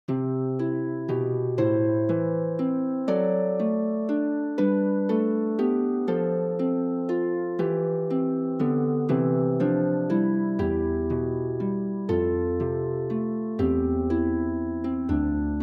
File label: morning has broken harp